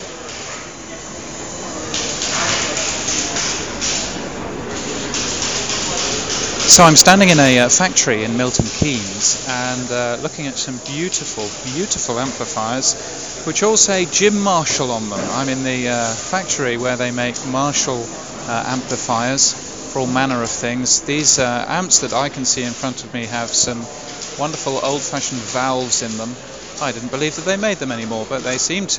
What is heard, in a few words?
at the marshall amps factory